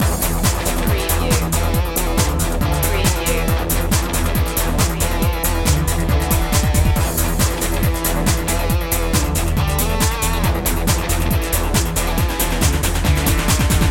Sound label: accelerator loop